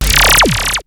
ZIPPER; SoundFactory; SMPMANIA; EFFECTS

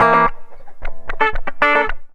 Electric Guitar, Loops; Guitars

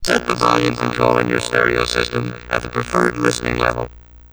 Processed Vocals, Loops

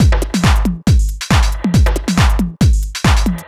Dance Drumloop; Drums; Dance Beats

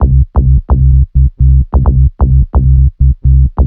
Synth Bass; Basses

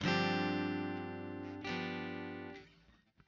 Acoustic Guitar

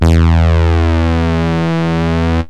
ob saw, yamaha rm1x synth bass and lead

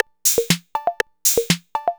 Electronic Percussion

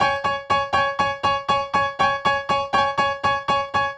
Acoustic Piano

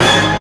HIT, STAB; SoundFactory; SMPMANIA

